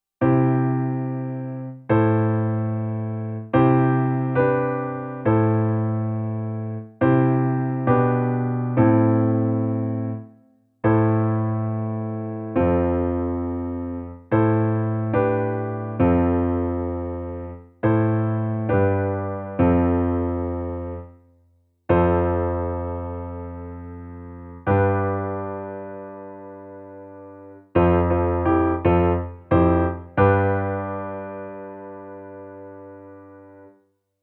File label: Passing chords